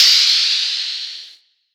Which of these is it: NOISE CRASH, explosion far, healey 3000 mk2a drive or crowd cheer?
NOISE CRASH